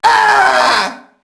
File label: mobster death; enemy; Max Payne